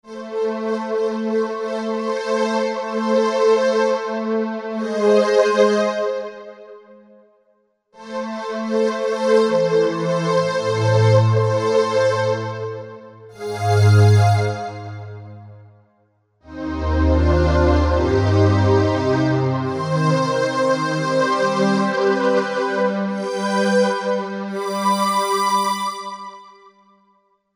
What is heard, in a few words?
2 voices; Korg MSC-12S Synth 3